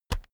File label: keyboard external key push